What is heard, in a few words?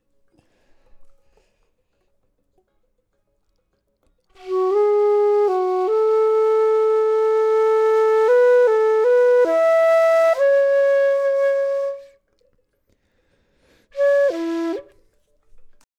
flute; logic